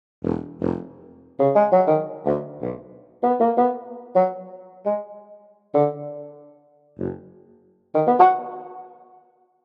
FAGOTTO staccato

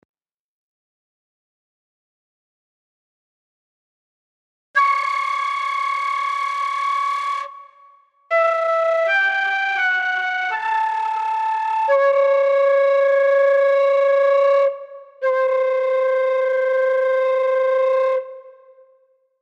flauto frulato

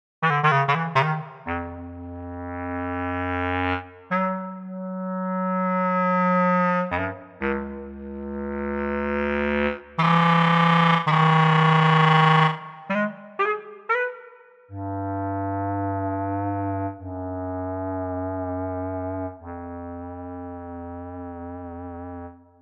CLARINETTO cl basso

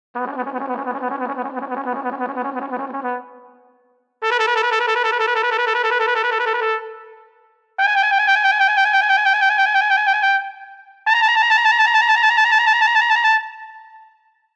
TROMBA trylky

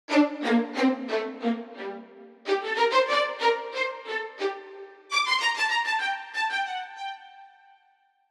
VIOLINI staccato

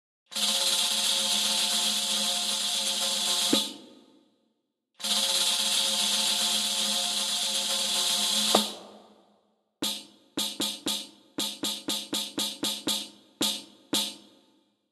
tamburina

